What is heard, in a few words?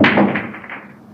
Break